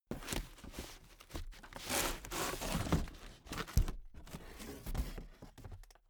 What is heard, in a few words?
cardboard box big unpack handle